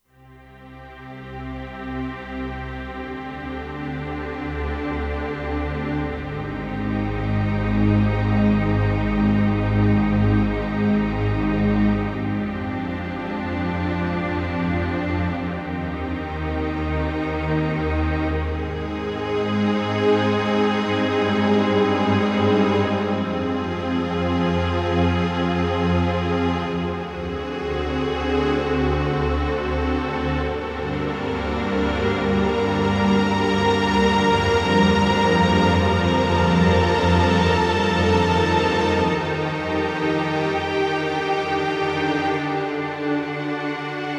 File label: Stereo Slo Str, Kurzweil K2500X; Factory Programs